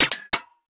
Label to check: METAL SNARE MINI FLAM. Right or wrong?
Right